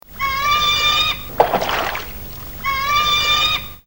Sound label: Eagle catching Trout; Airwolf